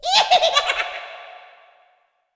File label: witch fast